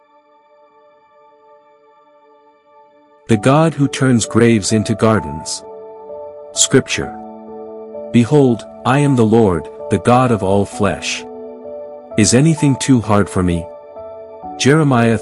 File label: devotional